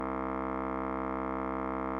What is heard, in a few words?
Instrument